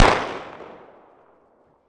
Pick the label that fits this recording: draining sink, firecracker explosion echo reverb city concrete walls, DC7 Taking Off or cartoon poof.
firecracker explosion echo reverb city concrete walls